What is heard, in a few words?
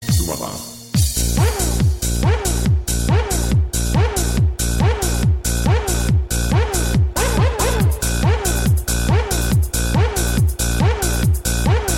dj mix ringtone